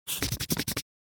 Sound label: Pen scribbling on scrapbook paper